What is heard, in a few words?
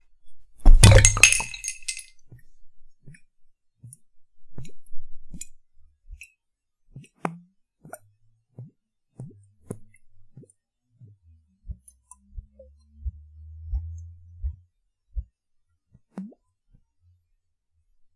Bottle Falls Down And Leak